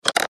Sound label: Button Switch; Multimedia Web Interactive; Buttons (Sound Effects:Multimedia